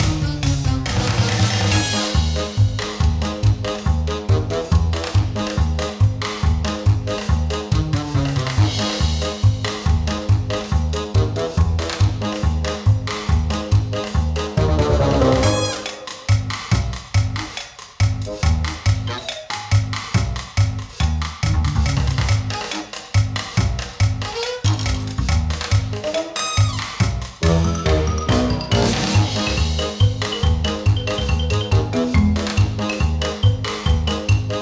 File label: LEVEL FLOWERS Platform Action LOOP